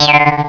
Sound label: Jaw Harp; Instrmnt; Sound Library 2000; Wayzata Technology